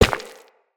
Run Rock, footsteps; Operator

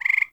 Forest Frog Close; Warframe; Grineer

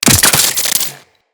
Head Shot Metal, Bullet Impacts; Weapons

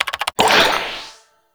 Messenger Message Send; Warframe; Ambience; Nineteen Ninety Nine

